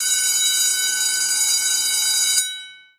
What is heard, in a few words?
win bell; power jackpot win